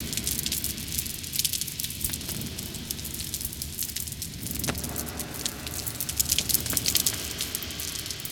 Sizzle Ambience

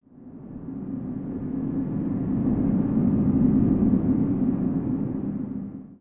Wind Cave One Shot; Lego; ambience

